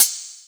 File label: Hats Electro Open